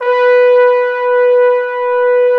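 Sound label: Bone Sec, trombone section; Roland L-CD702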